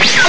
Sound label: teleports